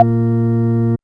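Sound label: ORGAN TONE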